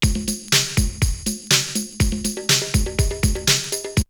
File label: Drumloop; ZERO G - Datafile DISC 3